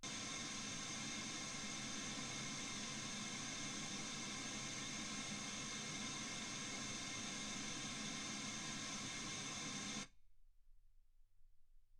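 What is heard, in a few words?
white gaussian noise 48kHz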